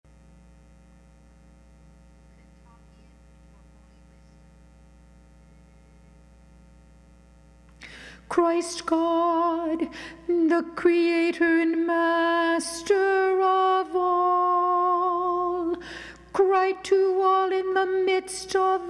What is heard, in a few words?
kontakion mid pentecost alto